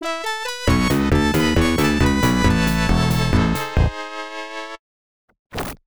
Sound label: Game Complete; paperboy; arcade; Bridge 4